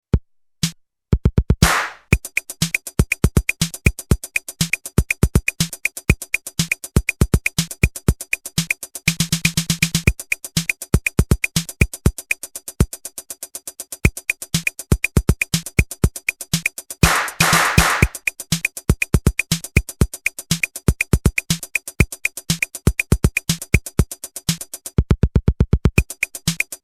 Akai XR10 Beat Syn

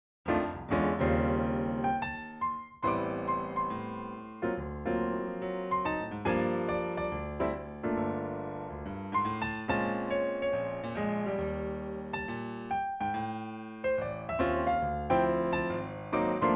Steinway Jazz Piano

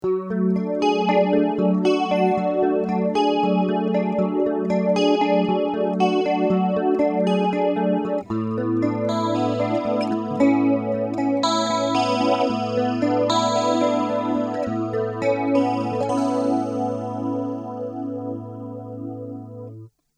Electric Mistress Chorus

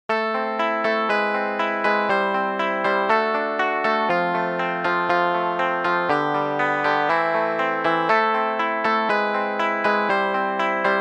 Guitarist

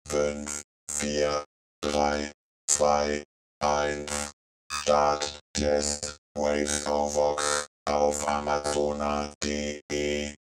Waves Ovox

